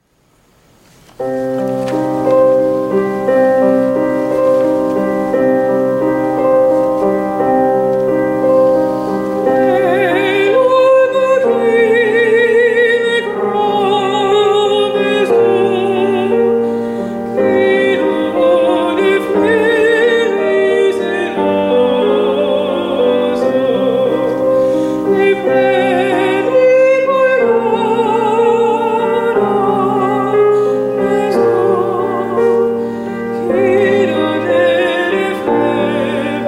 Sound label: [F] singing opera french art songs cradles; Les Berceaux - Fauré